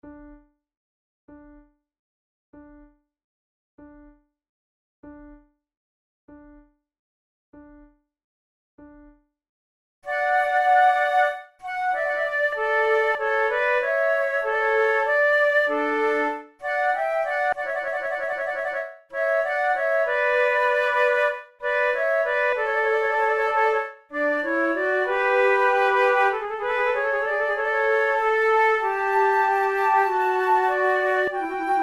sonate en trio, gravement, flute

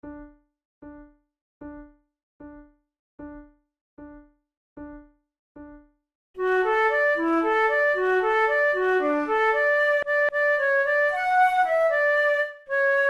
duet, flute

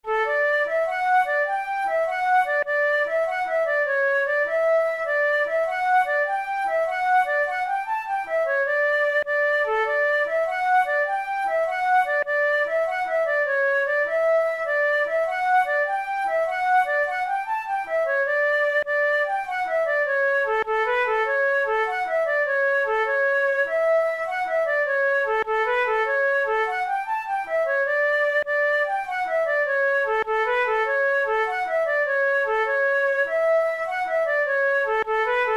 jig

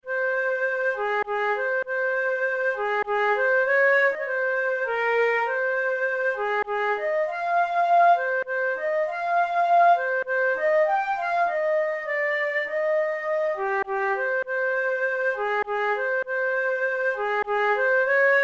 waltz, flute; brahms; waltz no15

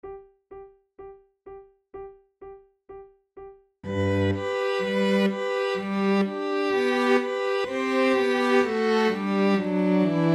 strings, flute; all in a garden green

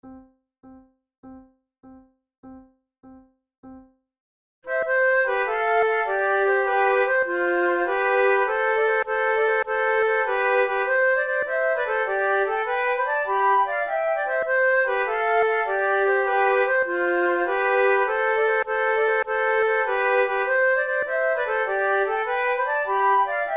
flute sonata gigue; Mattheson; flute tunes

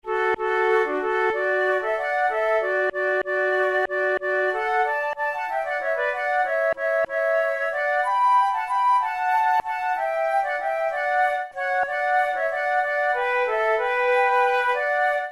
duet, flute tunes

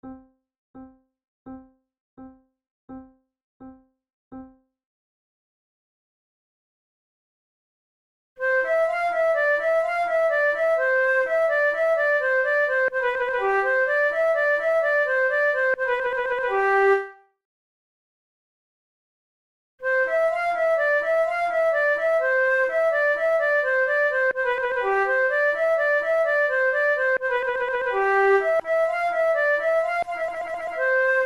fanfare, flute; naudot

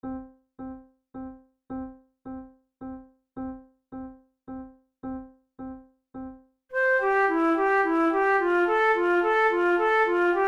methode de flute; tulou

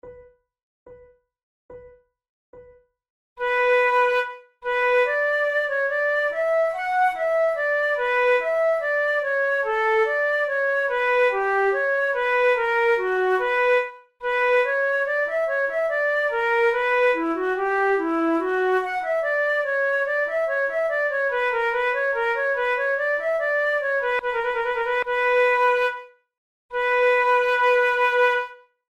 prelude, flute

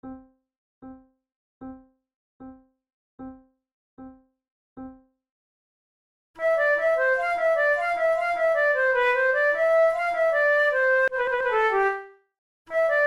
musette, flute; Naudot; 6 babioles

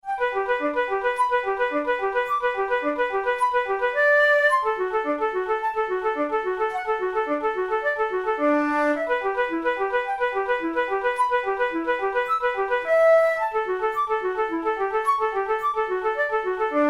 studies for the boehm flute; drouet 72

